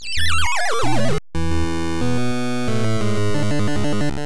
Miss; donkey kong 3; nes

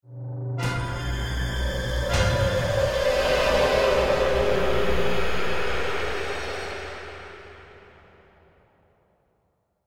Stinger Decrease Action; Naughty Bear: Panic in Paradise; PS3; Xbox 360

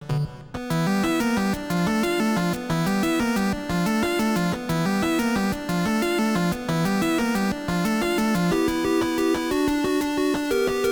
Trembling Suspense; Famicom Jump Hero Retsuden; Bandai

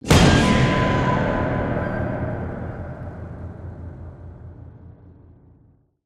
Music Stingers - Battle Stinger; conan xbox 360 gamerip 2007

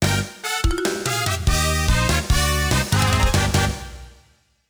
Lose Theme; Waku Waku 7; Saturn

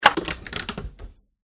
Innerdoor Unlocked Open; granny chapter two; android; mobile

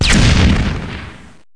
explode rocket; SAS: Zombie Assault 4

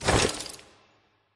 Click Task

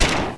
fire turret

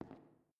Footstep Glass; Among Us

